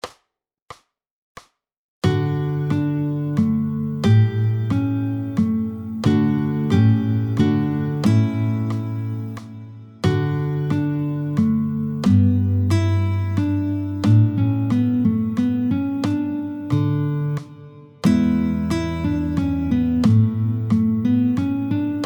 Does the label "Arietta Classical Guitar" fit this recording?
Yes